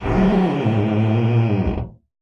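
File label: door creak large, squeaks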